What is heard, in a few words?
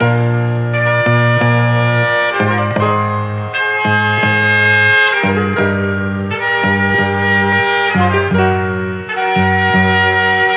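horns funky yet sad; Loops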